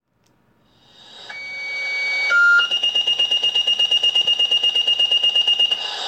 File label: House